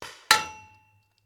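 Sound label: Paintball Gun Impact; Sound Ideas; Guns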